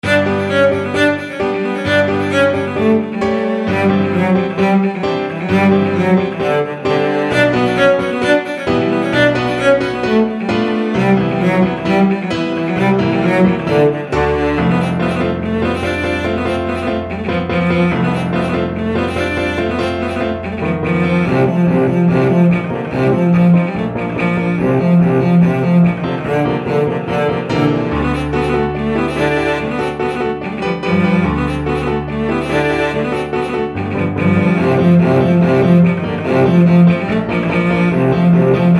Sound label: bartok romanian folkdance, cello